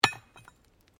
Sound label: Digging Pick Concrete